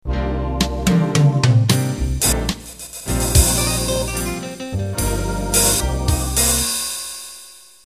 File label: Slow Jazz Intro